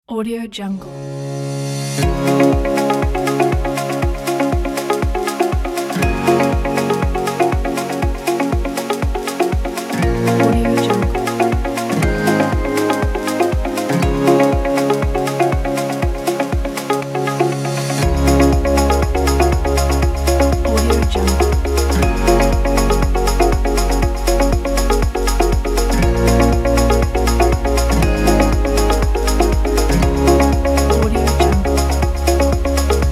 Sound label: this ambient music